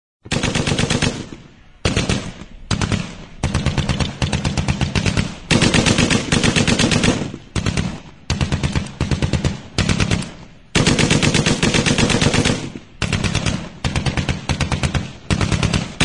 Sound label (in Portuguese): Metralhadora; Vinhetas